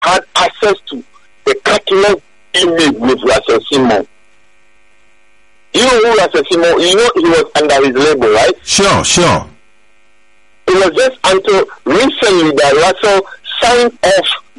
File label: Ringtone